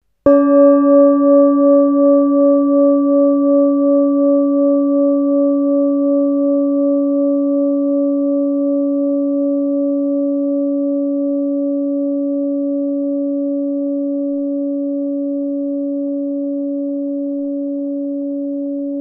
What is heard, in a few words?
gong oblong merkur